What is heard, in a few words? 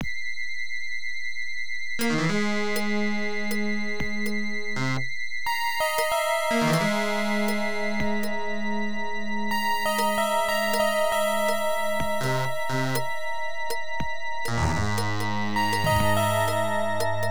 PSYCHO; Spider CM - FM